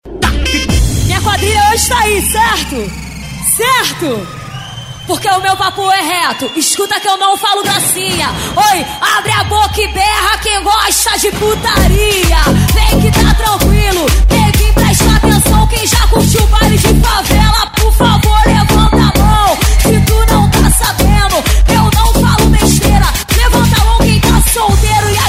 especial eletro funk

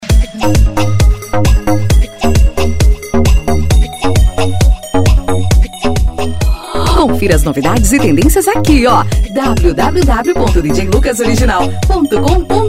eletro funk; cd balada eletro funk vol4

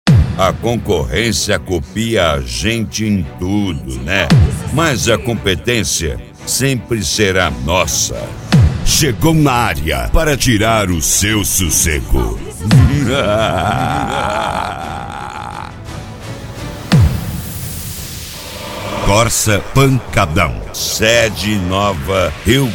mega funk; cd corsa pancadao

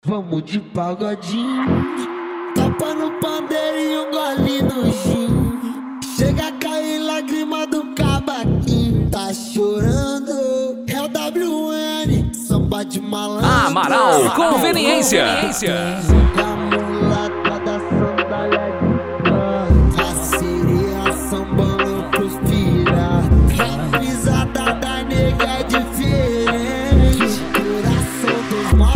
funk e sertanejo; amaral conveniencia; sarandi pr

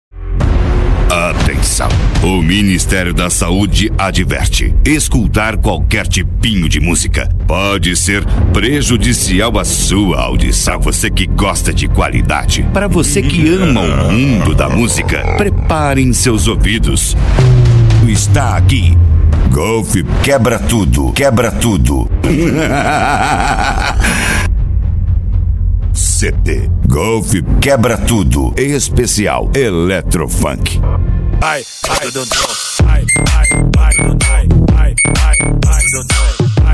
eletrofunk

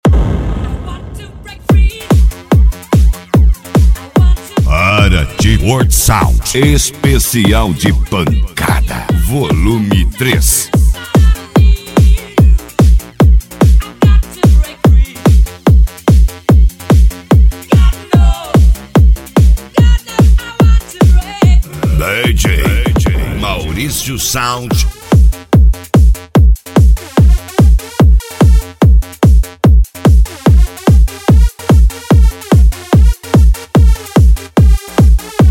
esp pancada

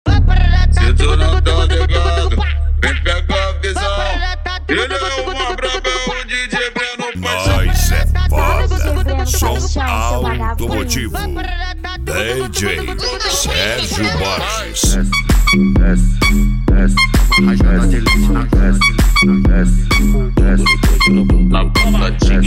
eletrofunk; som automotivo

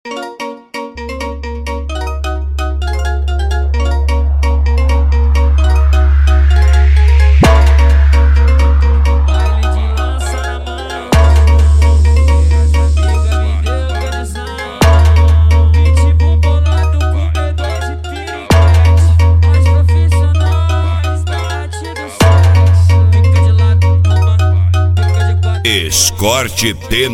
mega funk

